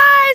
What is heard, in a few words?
Vocal Oneshots; Vengeance Samples; Vocals